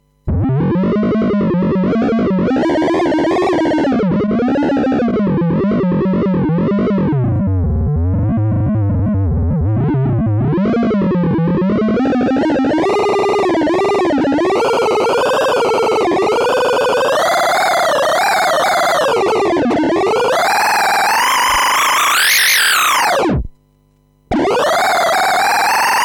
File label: smpte in edit mode